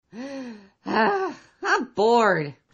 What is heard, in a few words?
humpf